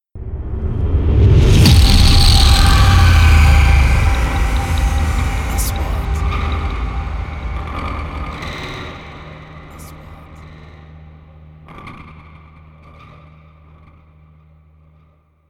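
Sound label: 3D SFX Trailer; مؤثرات تشويقية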